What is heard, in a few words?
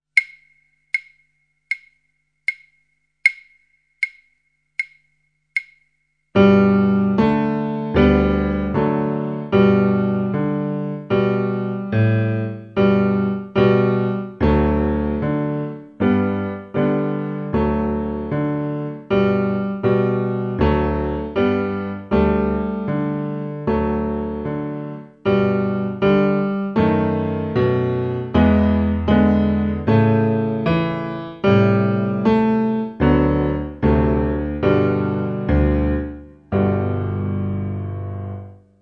piano